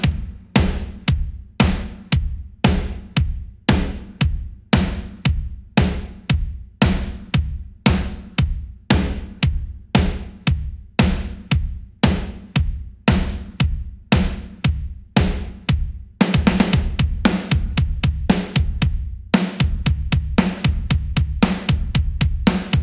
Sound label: Instrumental Drums; nowhere station